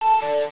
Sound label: jump; quake2; coop; battlebrowzer